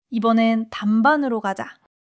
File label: laughter